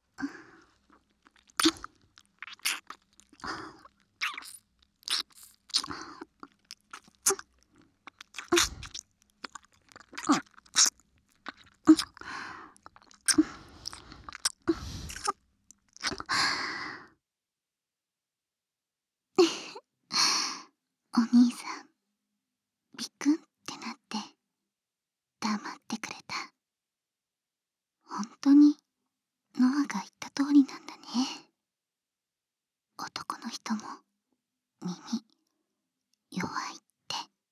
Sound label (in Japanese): 耳舐め, ASMR